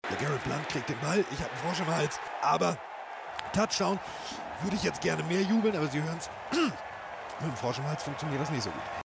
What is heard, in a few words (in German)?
Frosch im Hals, Klingeltoene